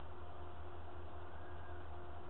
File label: Screaming Girl